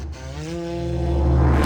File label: Mus Tsunami 145 Light; from dust